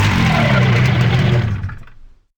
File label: gun motor stop; weapons; dragon; garrysmod; tf2